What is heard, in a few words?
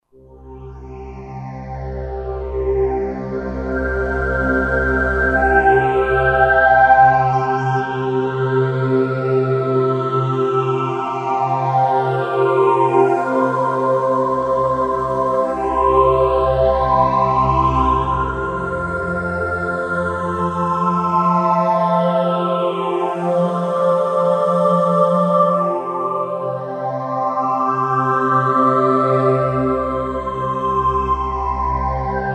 JD-800 Choir, Roland SR-JV80-13 Vocal Collection